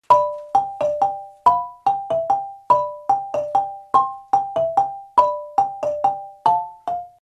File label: lead high muchongoyo, marimba; kare mugomba